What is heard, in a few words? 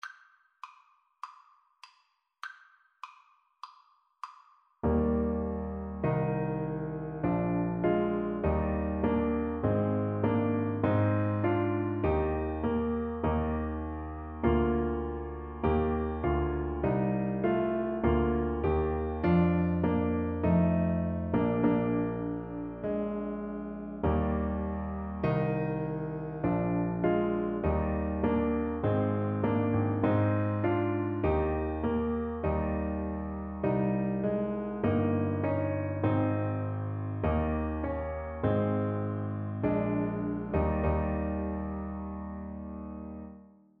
holy holy holy, Tuba